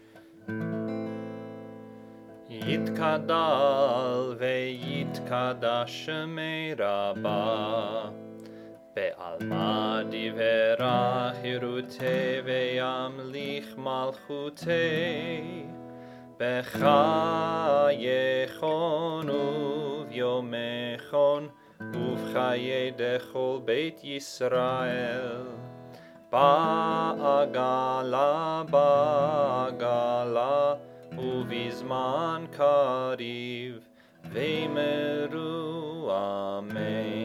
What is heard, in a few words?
Chatzi Kaddish High Voice; Hebrew